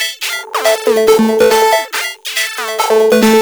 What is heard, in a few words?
140 Synth A, 140 BPM; loops; CHIPSHOP DELUXE